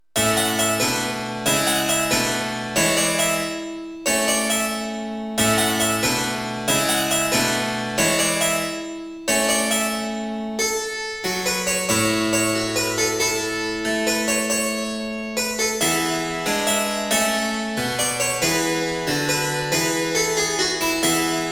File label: evening walk cembalo